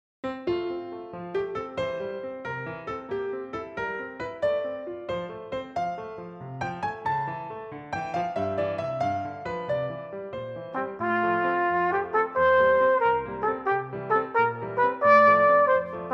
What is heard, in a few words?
First Rep Scherzo Full Trumpet